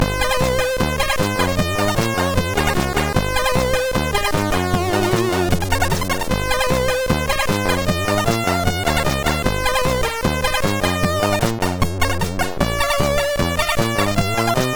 Mag Tune, MOS6581R4

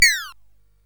Dark Castle, CSG8580R5

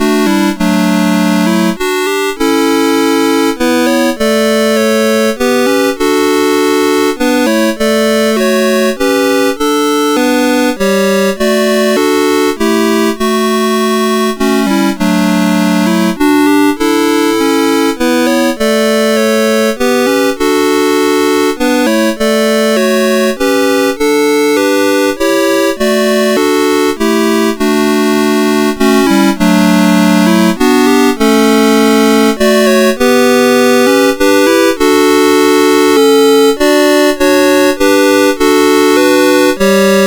First Nowell, MOS6581R4

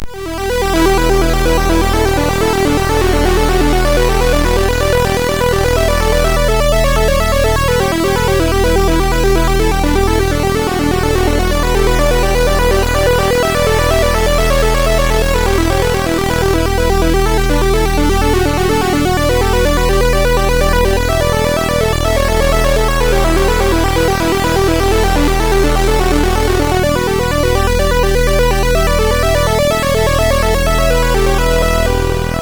Speech, MOS 6581; Speech Base 1; MOS6581R4; hvsc

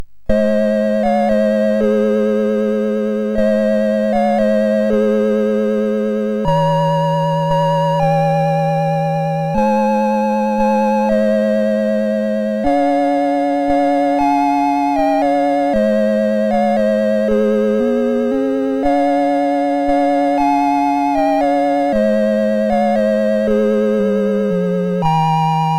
Silent Night, MOS6581R4

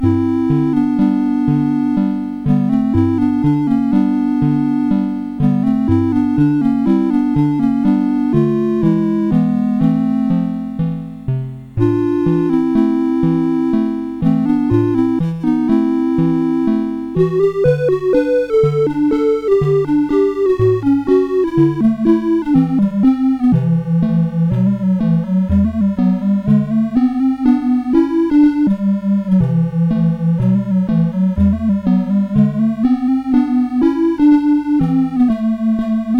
Polonaise Opus53, CSG8580; CSG8580R5

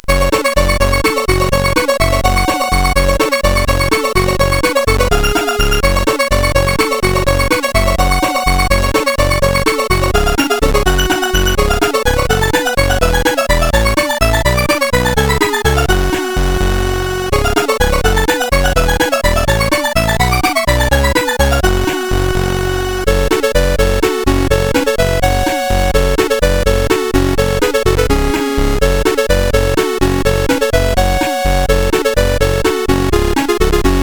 Classic Piece, CSG8580R5; sid

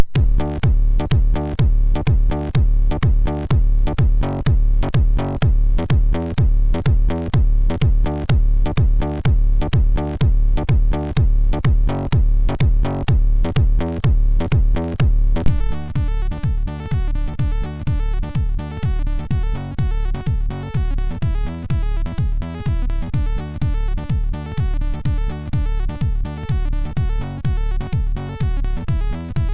Synthesis, sid MOS6581R2

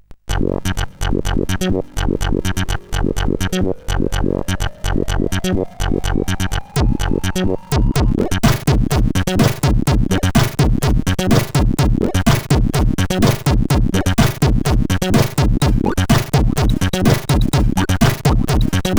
Bass Echo Test, CSG8580R5 sid

